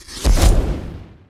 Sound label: Skill Stab; Vata Stab; Fly For Insanity